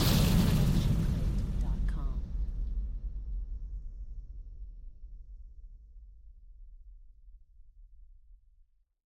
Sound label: Magic Explosion; SoundsCrate